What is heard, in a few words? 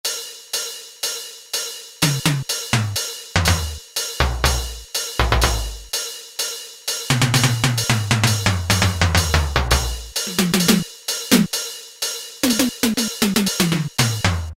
Kawai K1m factory patch E Tom Hi Hat; Factory patches - I banks